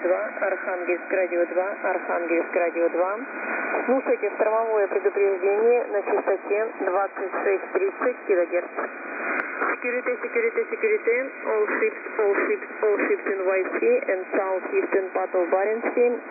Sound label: 2182kHz; HDSDR